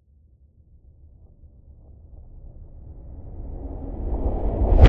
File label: Swell; bottlerocket